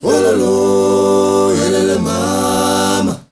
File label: MALE GRP, M. CHOIRS, Heart of Africa